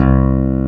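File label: Clavinet soft C2; USB Soundscan vol.09; AKAI; Keyboards Old School; CLAVINET1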